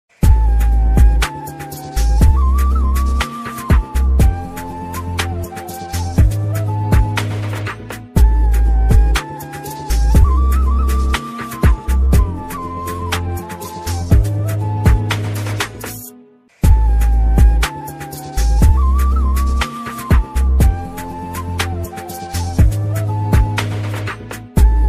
remix flute ringtone

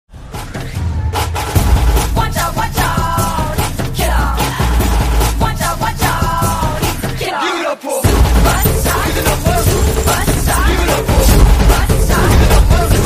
tamil ringtone bgms; thalaivar 170; vettaiyan

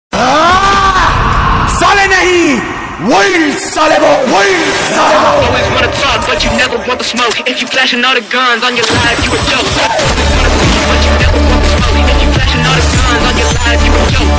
agent bgm ringtones

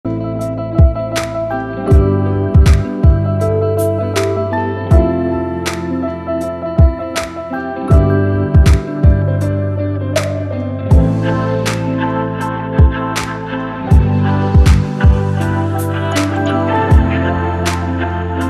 ringtone lofi

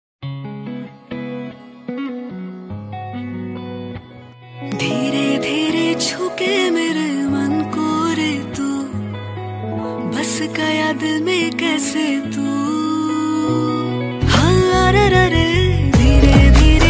Ringtone bgm; Devara Part 1

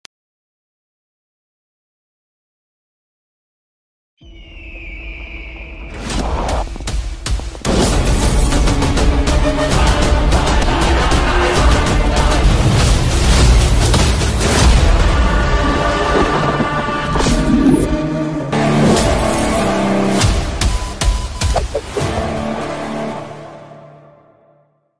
trailer bgm

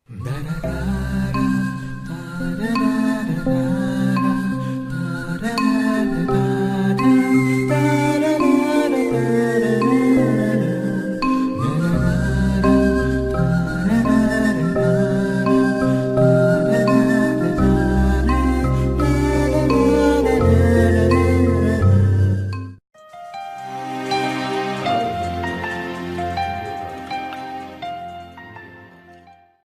love bgm tamil love bgm bgm ringtone tamil bgm; mounam pesiyadhe; surya

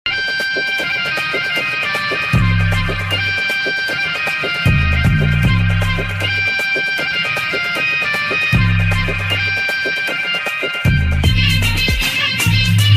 Song Bgm; GOAT - The Greatest Of All Time

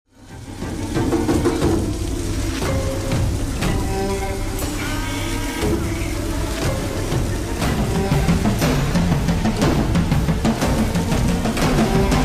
bgm interval horror bang; masooda; sageetha; thiruveer

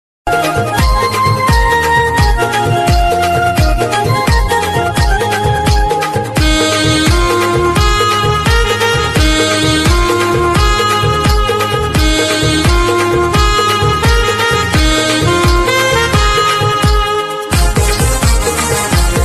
aai ekvira music ringtone; ekvira mauli

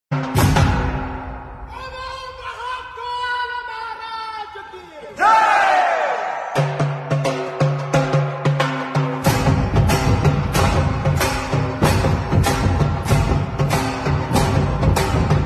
music meditation; mahakal

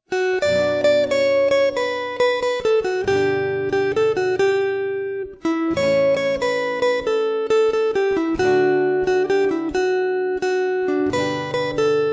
guitar version

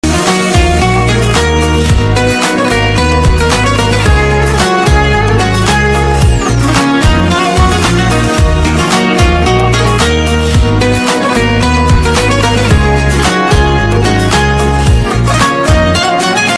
sad ringtone

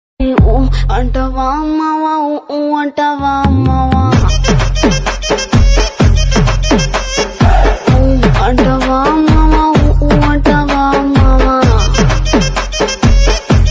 song ringtone, telugu movie ringtone; pushpa

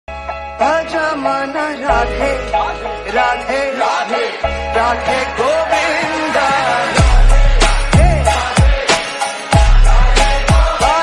radhe radhe govinda remix bhakti ringtone; bhajman

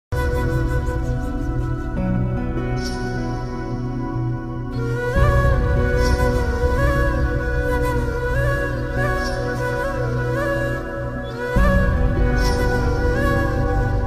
love sad ringtone

